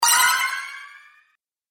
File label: Fantasy Spell, magic sound effect